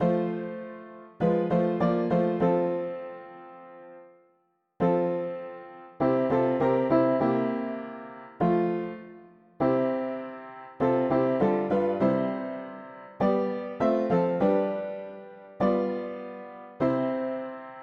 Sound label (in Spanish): mono piano; hymns; Himnario pequeno